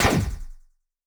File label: Heavy Laser Blaster Shoot